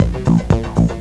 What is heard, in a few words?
bar, Music; Swoop 1.0.2